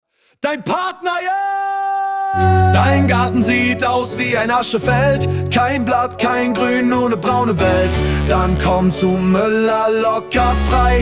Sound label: HipHop